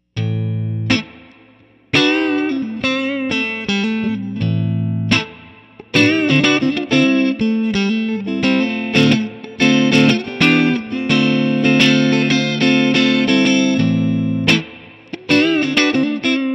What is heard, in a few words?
Warm blues, guitars